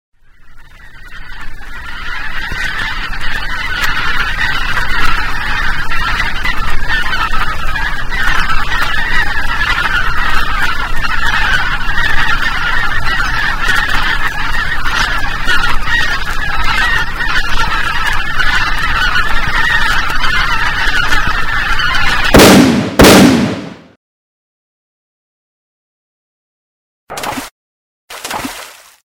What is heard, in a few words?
goose